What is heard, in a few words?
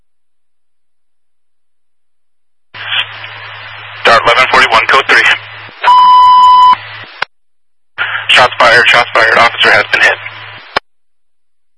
shots fired